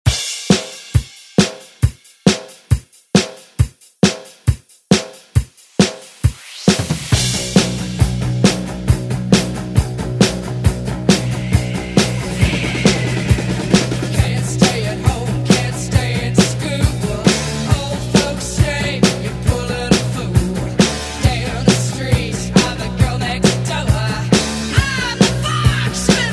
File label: 70s ReDrum; DJ Mhark